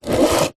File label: foley wooden box small empty slide smooth concrete screed